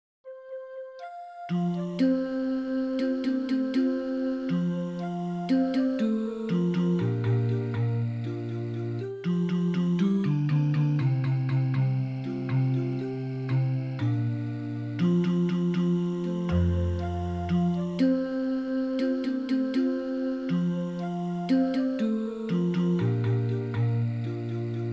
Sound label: Bawo Thixo somandla bass